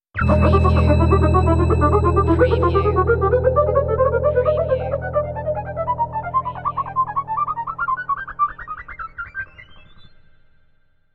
Scifi Audio Logo Ident, Climbing, Playful, Mischievous